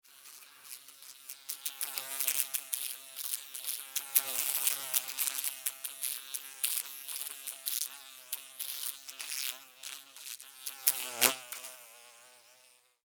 Fly, Window, Hit, Surface, Buzz, Wings, Insect, Bug; Sound Effects:Animals:Insects; Flies; Blastwave